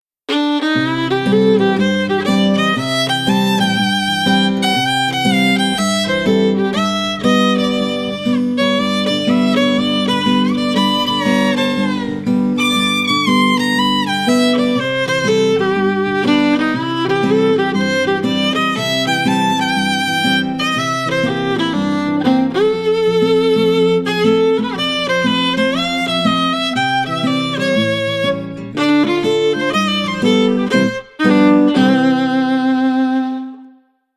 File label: SWING